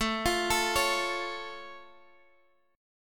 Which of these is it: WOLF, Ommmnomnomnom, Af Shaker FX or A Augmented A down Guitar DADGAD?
A Augmented A down Guitar DADGAD